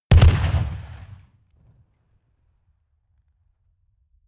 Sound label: rifle ak-47 exterior fire double big; comedy cartoon; pistolen gewehre; Pistols and guns